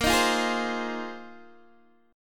A sharp 7th Suspended 2nd Suspended 4th, Guitar, DADGAD; down